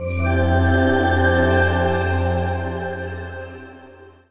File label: se jingle; pro evolution soccer 2011 3d; nintendo 3ds gamerip